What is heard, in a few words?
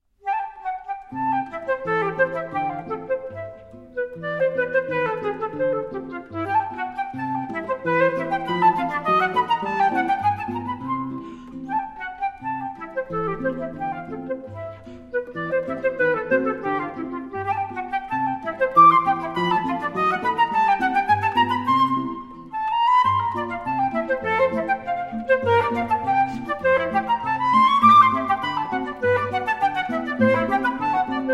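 suite III, flute, guitar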